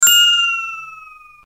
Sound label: Sleep Bell